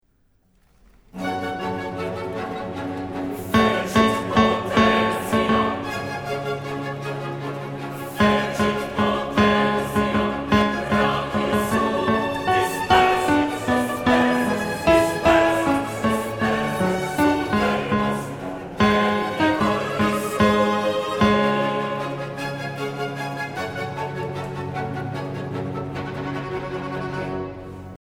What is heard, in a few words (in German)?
Tenor; Magnificat